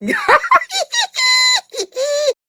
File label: Laugh; Grineer Queens Guards; Battle Barks; Warframe